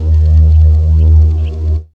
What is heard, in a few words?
SUB HUM; E-Lab; X Static Goldmine 1